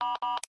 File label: Walkie Talkie Off